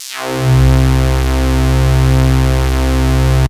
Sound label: Big Synth Basses